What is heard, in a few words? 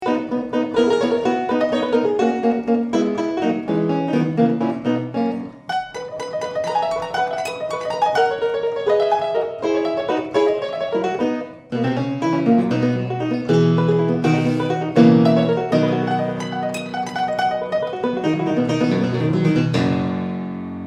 square piano broadwood fantasia in c